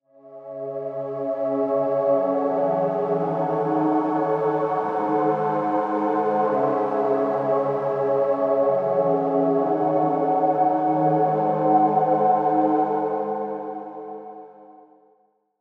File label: Pad; All Or Nothing; Ice'd Out Collection